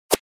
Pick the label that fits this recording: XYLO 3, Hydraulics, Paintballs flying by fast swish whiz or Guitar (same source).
Paintballs flying by fast swish whiz